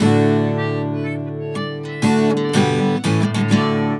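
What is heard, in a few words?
guitar, 120, Celtic; USB Soundscan vol.42; Celtic Flavours; AKAI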